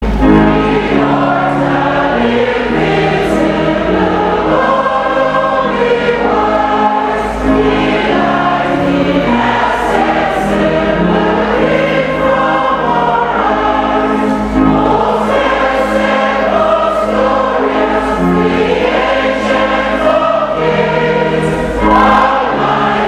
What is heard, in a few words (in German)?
kirchengesang auf jamaika